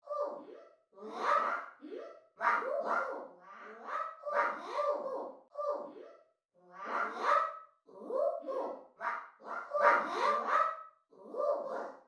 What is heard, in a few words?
ambient loop